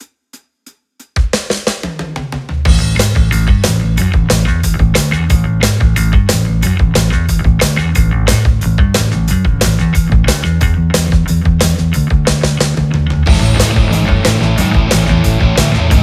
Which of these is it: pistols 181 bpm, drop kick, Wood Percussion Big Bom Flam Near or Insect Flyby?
pistols 181 bpm